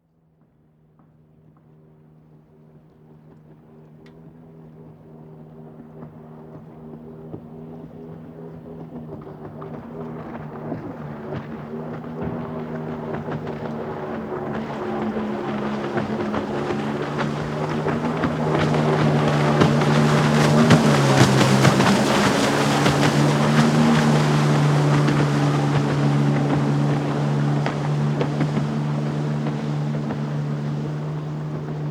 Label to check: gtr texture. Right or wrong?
Wrong